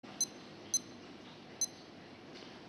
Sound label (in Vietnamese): tieng bam dieu chinh gio dong ho casio